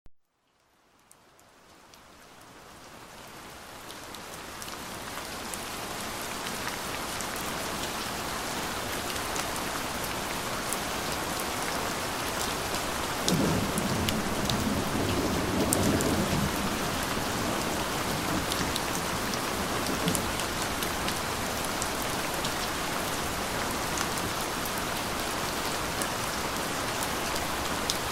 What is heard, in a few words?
Rife Frequency Gentle Thunderstorm Sounds; Sacroiliitis Healing